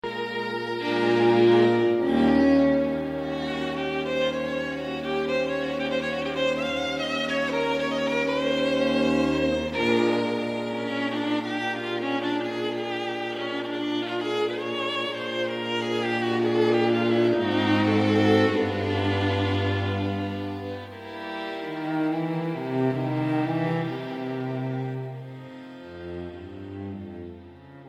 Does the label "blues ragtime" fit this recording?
Yes